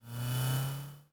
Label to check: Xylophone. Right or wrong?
Wrong